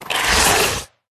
Wild Flower Block

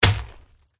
dragon blue attack contact

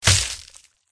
gidra attack contact bottom

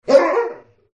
dog wound

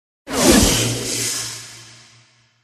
bat

